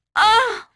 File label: hit; NWNDS; Imoen